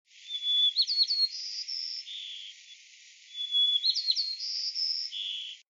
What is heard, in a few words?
Sparrow white crowned; birdsong